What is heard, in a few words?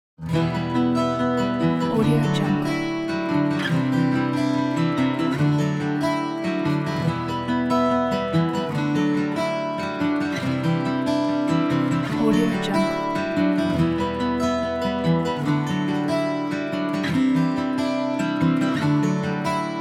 inspiring acoustic guitar